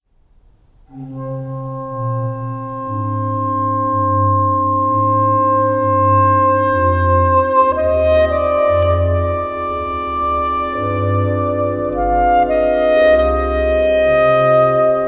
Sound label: Largo Oboe Sonata in c; buxtehude